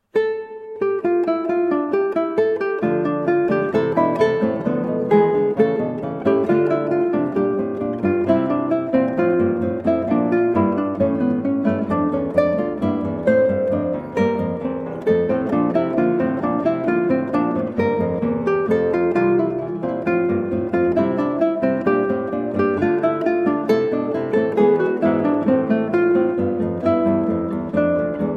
Alla Breve from Recorder Sonata in D Minor, Guitar; HWV 367a; George Frideric Handel